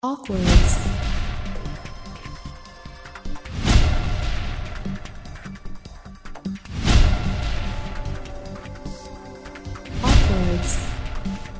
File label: breaking news